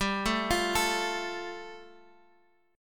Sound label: G 7th Suspended 2nd